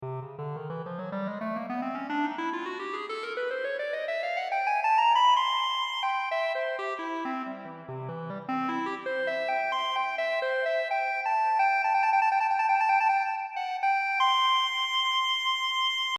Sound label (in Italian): clarino